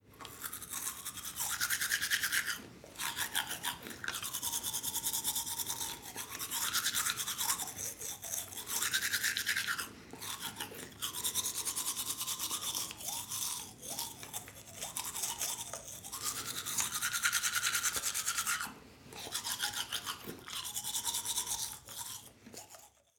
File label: Brushing Teeth